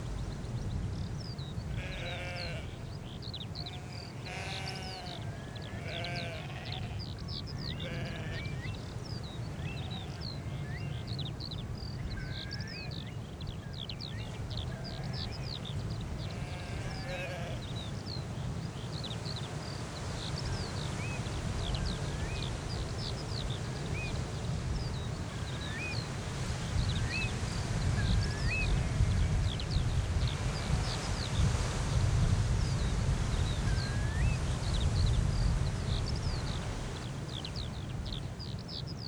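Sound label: Scottish village drive air; The 39 Steps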